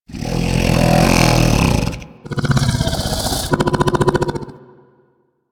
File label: Vocal Snore, critter; Warframe; Hunting Critters; Undazoa